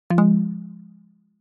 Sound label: multimedia notification alert prompt rubbery plucked negative; zapsplat